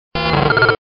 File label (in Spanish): Grito de Chinchou